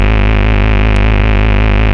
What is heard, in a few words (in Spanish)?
onda pulso